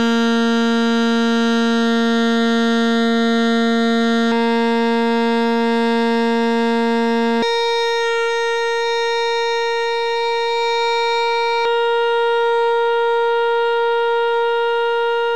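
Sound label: saxophone test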